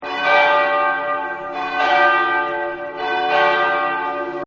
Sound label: Church Bells; Musical; Partners In Rhyme